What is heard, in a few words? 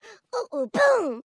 Woman Dance Happy; Zomboid; Lifestyle